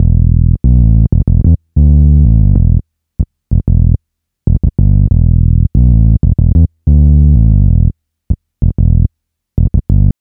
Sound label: Bass, 094 Emin, Hip Hop